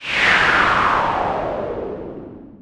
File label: swoosh; Stunt GP